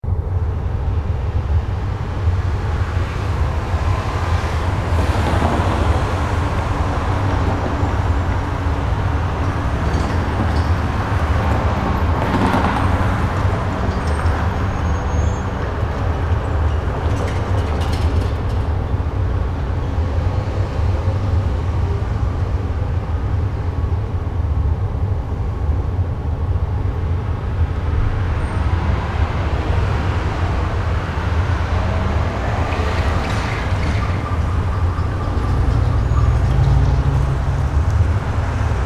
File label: City Noise Ambient